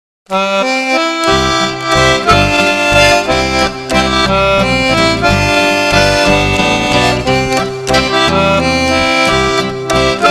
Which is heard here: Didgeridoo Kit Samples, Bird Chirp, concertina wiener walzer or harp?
concertina wiener walzer